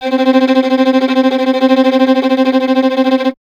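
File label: string violin tremolo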